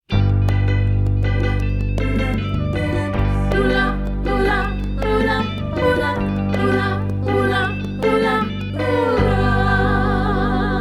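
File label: Gods Rain with vocal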